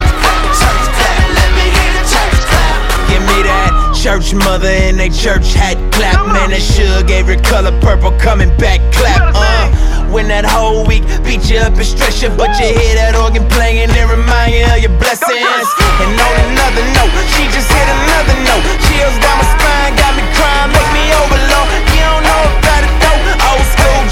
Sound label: Church Clap